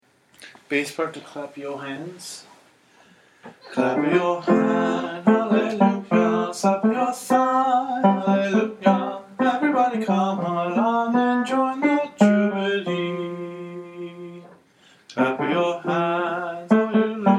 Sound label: clap yo hands bass